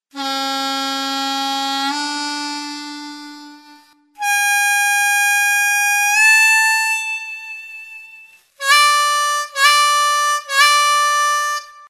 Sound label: bend, harmonica